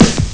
snare glup; Amiga Soundtracker Sample Packs

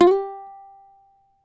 CAVAQUINHO SLIDE, Guitars; Spectrasonics; Hans Zimmer Guitars VOL-2

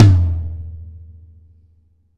R B Floor Tom A Close; Sonic Foundry (Sony Creative Software) - Drums from the Big Room The Mixes; R&B One Shots

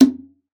Conga, Session Instruments

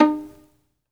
SOLO VI, Synclavier Strings; ILIO

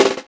Caixa Roll, Percussion; Roland BK-5

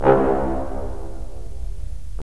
BRS, Roland LA Composer

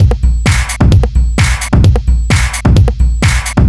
4 floor beat, trance

